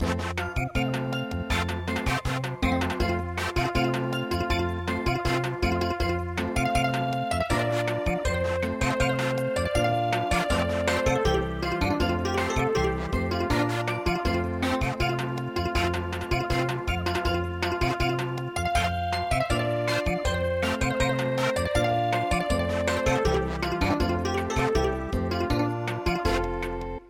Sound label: Samba; Get up and bar the door